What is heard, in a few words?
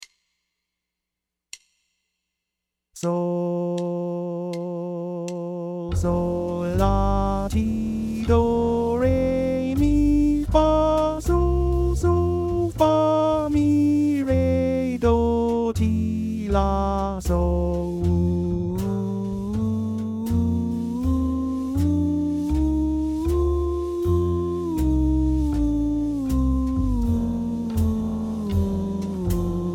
F Mixolydian Bb 80